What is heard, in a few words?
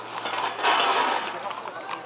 Metal Gate